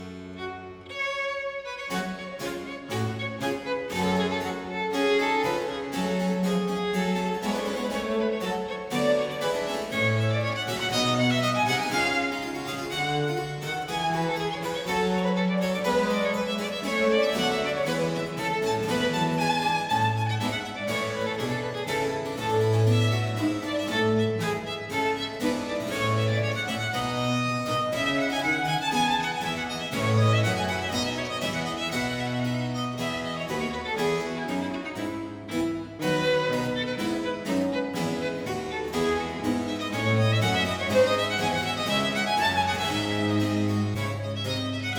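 Sonata for violin & basso continuo in G minor - Allegro; Purcell; Complete Chamber Music; Brilliant Classics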